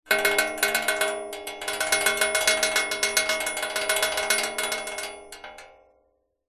foley metal thin object long fast rattle clatter larger metal frame